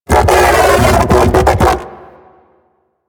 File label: Mech Ambient Vocal; Warframe; DE; Vehicles